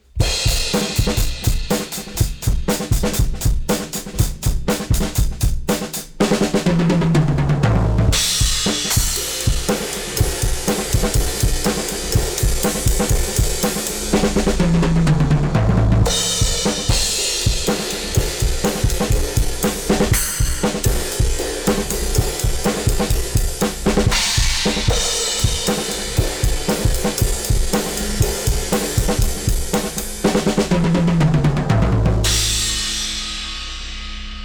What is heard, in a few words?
drums Drum Bus Saturation; Xtressor